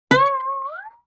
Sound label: Guitar Plink Slide; Vintage Cartoon; Musical Elements